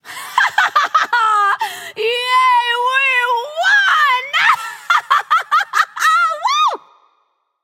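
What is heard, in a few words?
yay, female; smite; voicepack; hera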